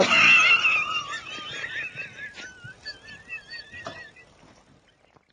risada francesa

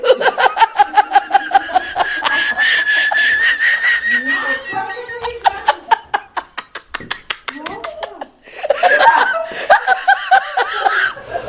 risada tuberculosa

